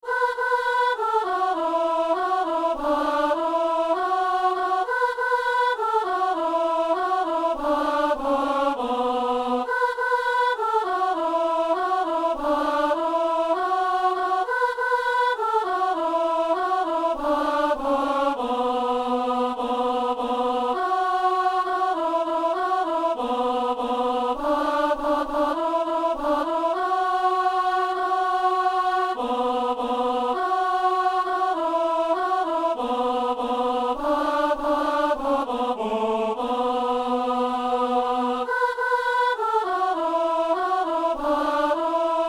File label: soprano